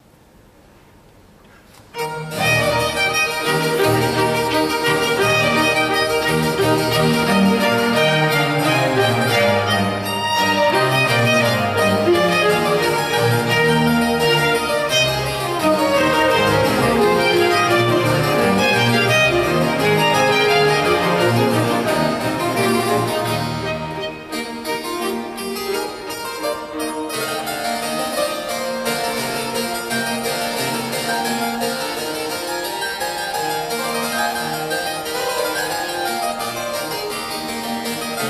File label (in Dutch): concert voor klavecimbel, Tempo Giusto; J.S. Bach; BWV 1053 in E gr.